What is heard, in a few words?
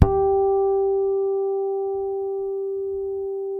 HARMONIC G, PEDULLA BASS; Masterbits CLIMAX 7 - Bass